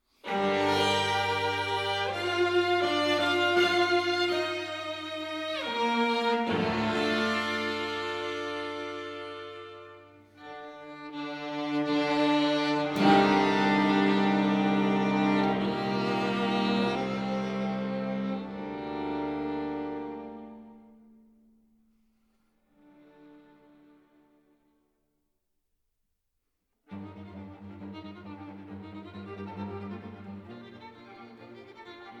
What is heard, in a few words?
String Quartet in G minor; No. 1 op. 27 - Un poco andante - Allegro molto ed agitato